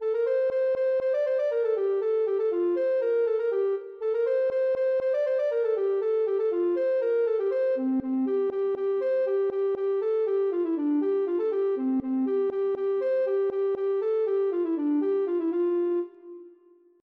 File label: ocarina